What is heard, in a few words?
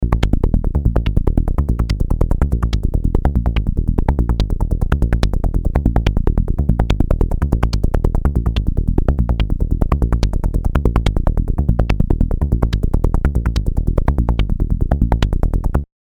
ppg, modular; modular planet